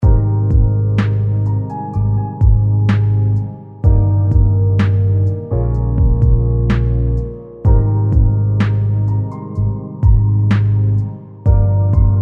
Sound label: LOFI short